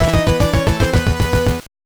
Player Miss; Akumajou Densetsu; Family Computer; NES gamerip

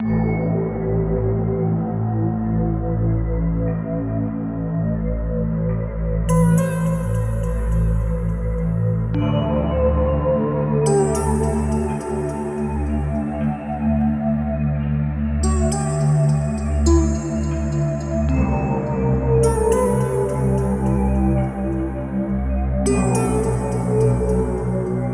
Horn Path 3 Loop 105 bpm

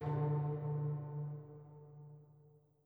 chime; chime sharp

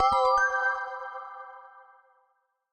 Jingle Chara Levelup; PS Vita; PS4; Bullet Girls Phantasia

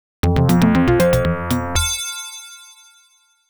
Wave Complete; Off the Wall

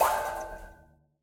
Lab Giant robot Shot; Lab Giantrobot Shot - Marker

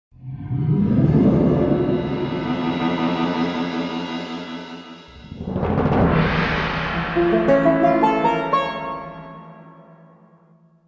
Before Death Sword Battle; the legend of zelda twilight princess

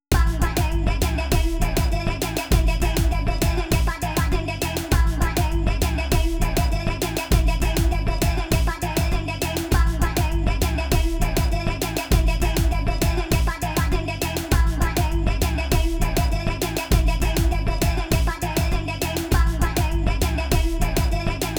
phaser

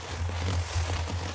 Scrape light Bass

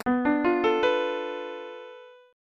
Tada

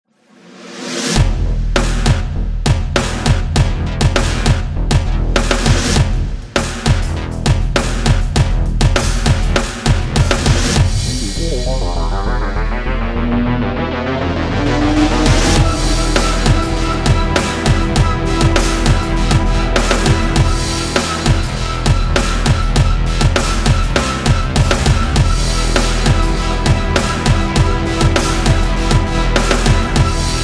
Camp Fight; Evacuation

